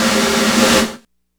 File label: DR660 DANCE, DRUMS PERC